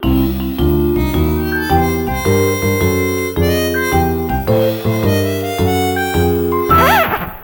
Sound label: dj booth fail; Zomboid; Lifestyle